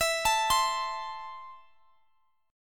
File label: E Suspended 4th Sharp 5th E, down, Guitar, Standard; Esus4#5 arpeggiated; Esus4#5 chord